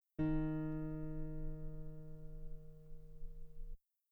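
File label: guitar D sharp 4 very long piano normal; bananenkeyboard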